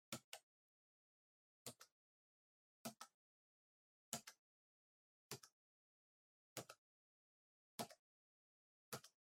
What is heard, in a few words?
PC Keyboard Far Single Gentle